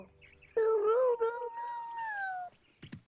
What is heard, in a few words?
awoo